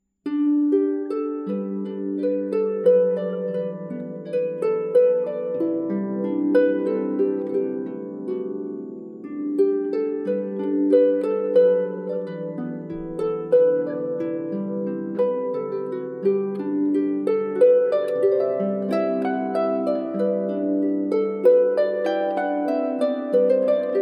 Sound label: Traditional Irish, harp; Inisheer